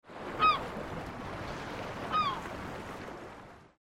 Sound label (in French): mouette